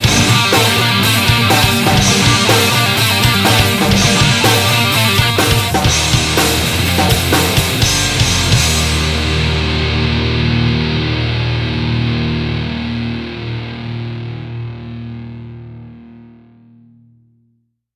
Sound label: Stinger; DFH Stadium